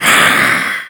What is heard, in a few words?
Neutral Skeleton Warrior death